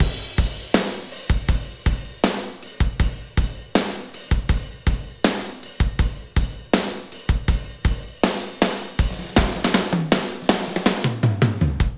160bpm loop

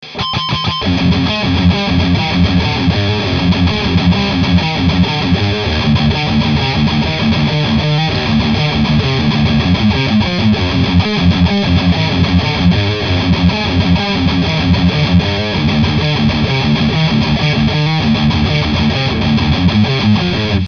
metal tone

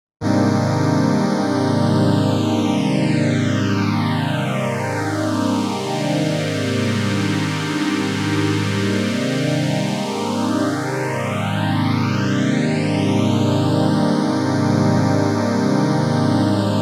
pure broad phased; stringer

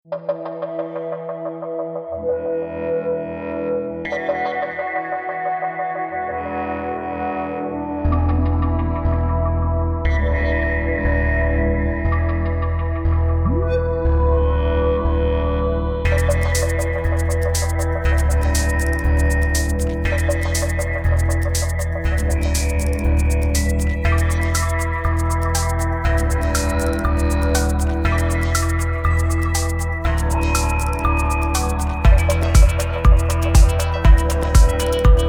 electronica; re4orm

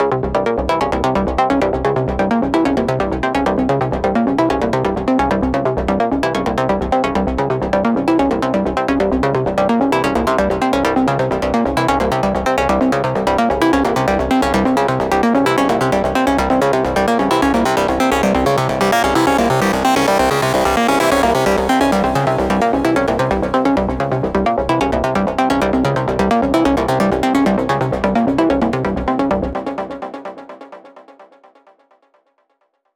melodic enchantment

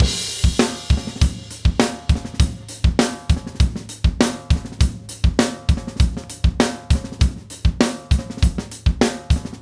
4 bar loop

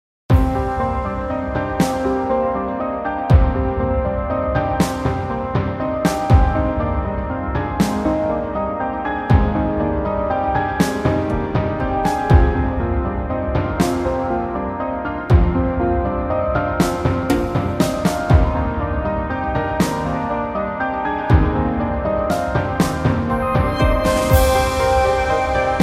orchestral kick; kick factory 2

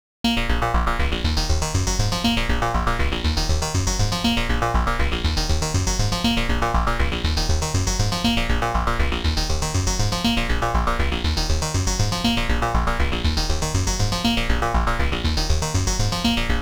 seq 16 analog saw; analog prophet saw

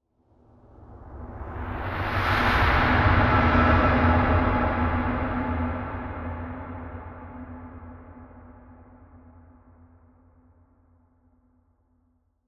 sweep

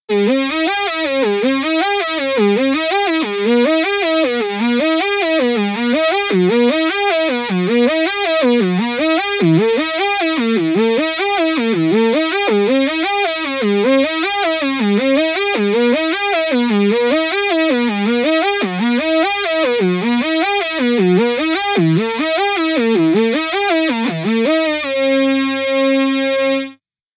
synth 12 string

